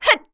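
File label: MON ATTACK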